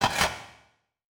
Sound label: Transition Hit Impact Electrical; Production Element